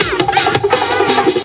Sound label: 166 conga scratcher, drum loops and breaks; 161 to 180 bpm